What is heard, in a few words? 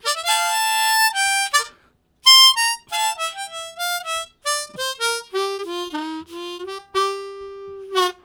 Faller, Harmonica Riffs; Zero G